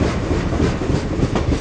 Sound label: Train; Gcanyon2